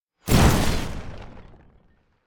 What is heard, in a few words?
warfare bomb whizz in hit close by explosion med; zapsplat